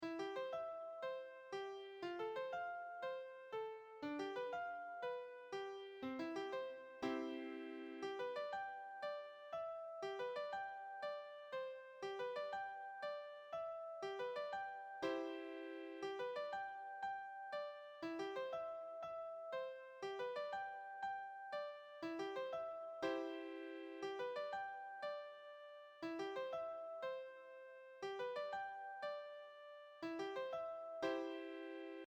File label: Fanfare